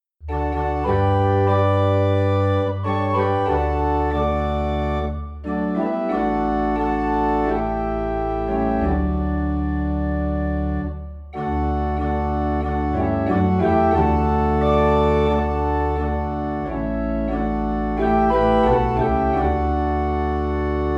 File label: Organ in D; Savior Like a Shepherd Lead Us